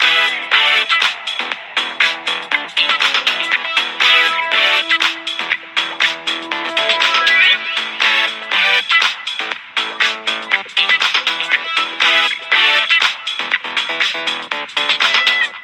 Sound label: Samsung